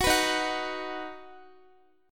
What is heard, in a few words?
Eb Minor Sharp 5th Guitar; Ebm#5 strummed